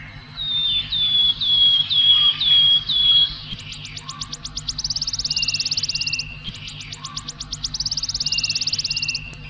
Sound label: wood warb